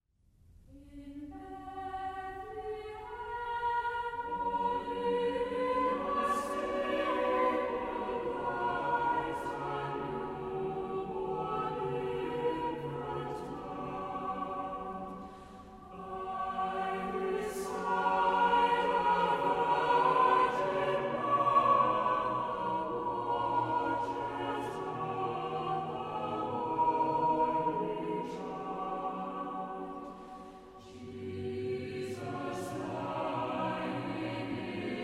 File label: Choir - Cradle Song